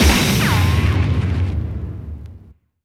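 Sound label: bass drum FX, dance drums; Best Service Dance Mega Drums; BD-FX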